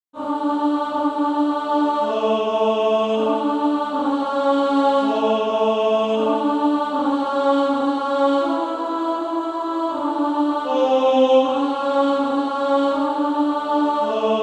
Female Choir; 1st Alto

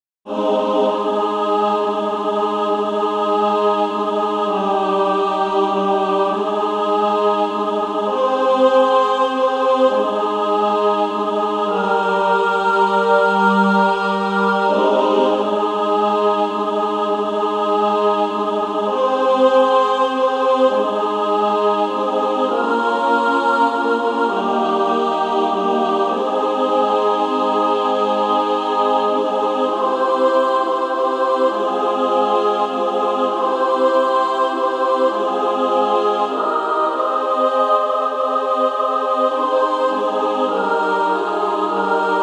Female Choir

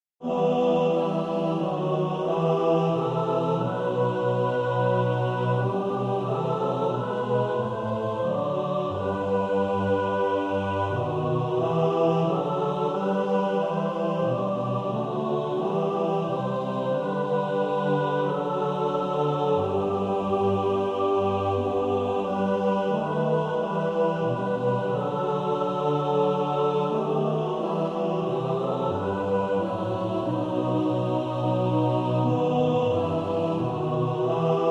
mixed

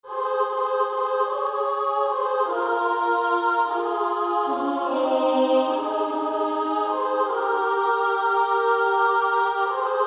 Female Choir SA; Only a step to Jesus

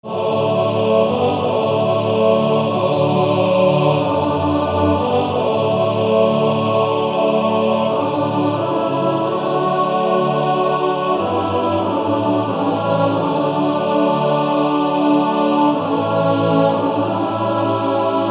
Male Choir TTBB

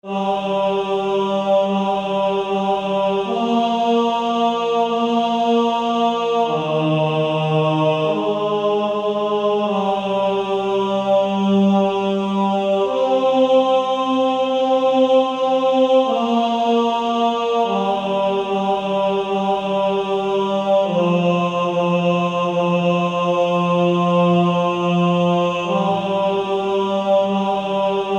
Male Choir

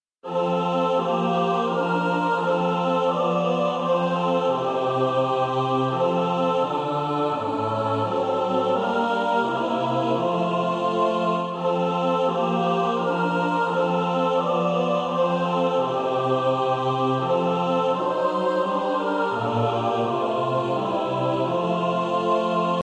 mixed

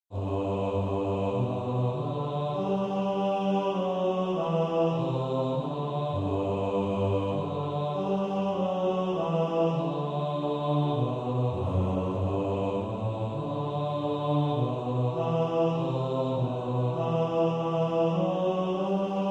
bas, practice music; Bass

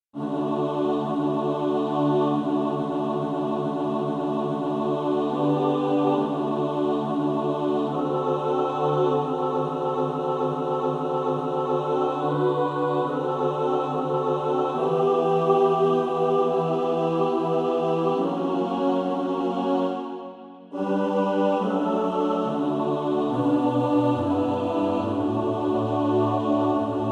mixed

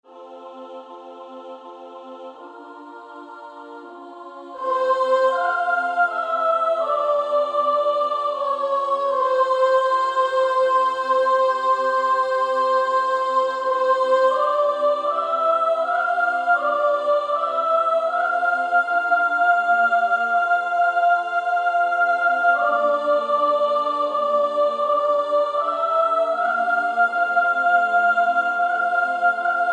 Female Choir; 1st Soprano; SSAA

